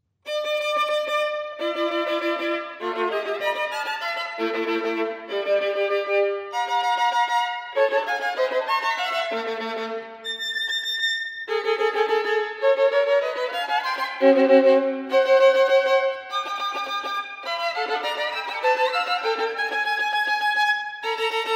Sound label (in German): Sonate Nr. 2 für Violine solo